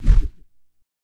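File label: Heli Blades Single Whop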